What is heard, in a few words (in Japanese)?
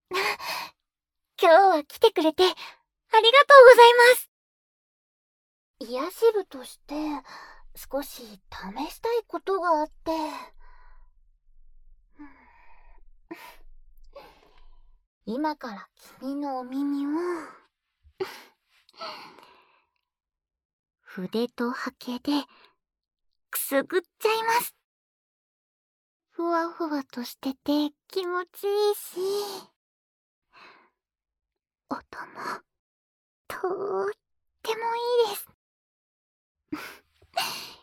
筆と刷毛で、お耳をくすぐり